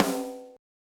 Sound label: snare drum kompressor; fett klingen lassen